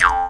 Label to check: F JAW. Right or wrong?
Right